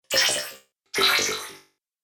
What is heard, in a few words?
Droid Wet, robot